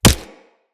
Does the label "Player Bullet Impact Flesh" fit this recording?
Yes